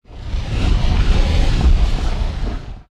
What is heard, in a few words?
hell rumbles; Source Engine; tf